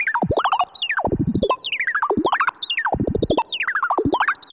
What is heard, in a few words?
ALARM